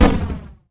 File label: grenade; AIWars